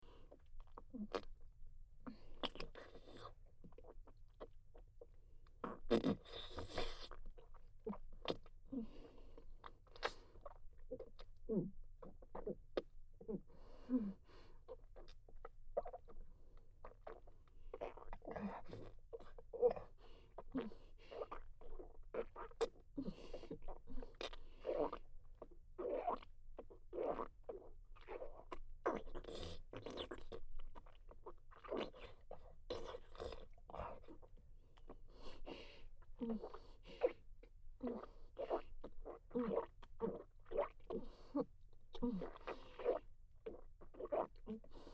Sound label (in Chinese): 真格深喉吞吐口交循环音轨; 与异世界当地黑暗精灵妻子进行浓密小穴交流